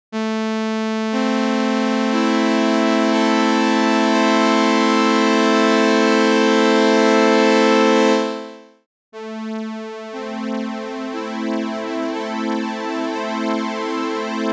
Klangbeispiel CHORUS DIMENSION-D; ARTURIA

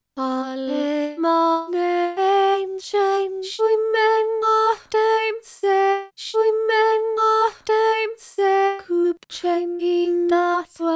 RealiVox Blue Klangbeispiel Entchen

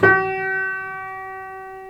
Pianosound kurz

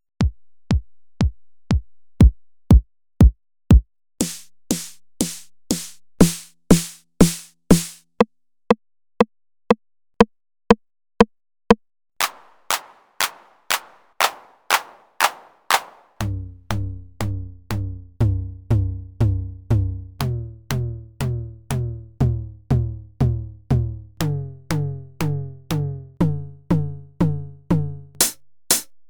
Klangvergleich DRUMAZON 2 vs TR-909; D16 GROUP; ROLAND CLOUD

